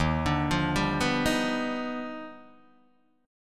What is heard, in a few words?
D sharp Augmented Major 9th D sharp Guitar Open D